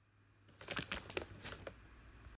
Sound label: paper